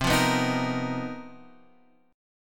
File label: B Major 7th Flat 5th C, chord shape; BM7b5/C; strummed; JGuitar